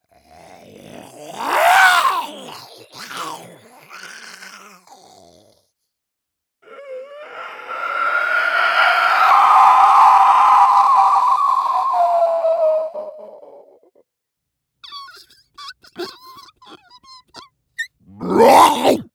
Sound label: Creature